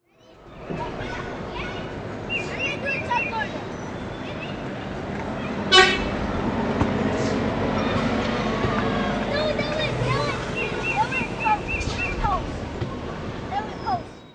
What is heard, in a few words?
outdoor